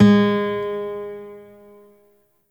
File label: Shawzin Oct One G Sharp; Warframe; Lotus Shawzin